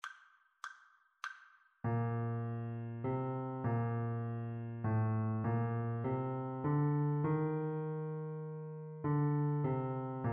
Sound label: partially completed melodic dictation